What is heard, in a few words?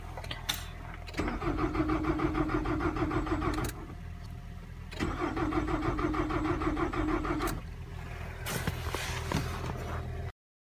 crank no start